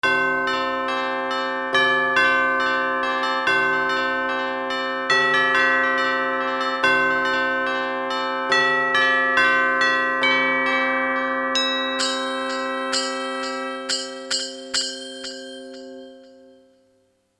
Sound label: Chime Cy